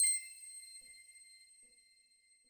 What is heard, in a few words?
count Up End; netent